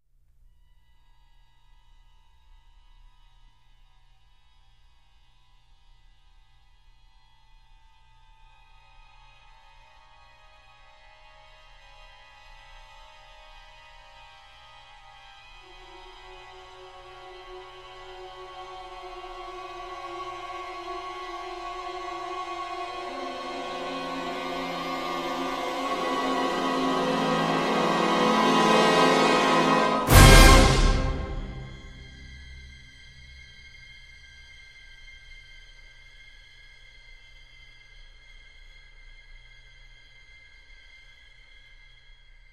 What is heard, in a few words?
5 tones All